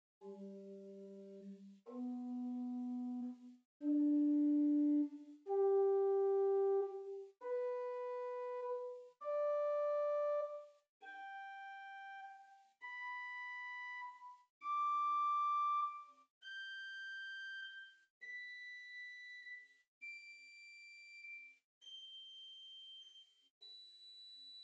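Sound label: Swell Nazard 2-2.3 arpeggio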